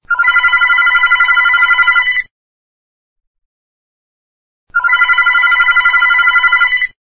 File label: home phone ring sound